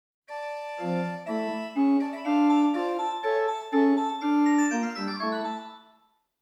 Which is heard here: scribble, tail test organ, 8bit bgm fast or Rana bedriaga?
tail test organ